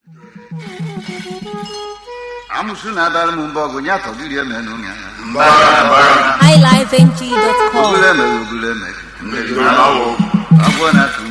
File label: Forest Chants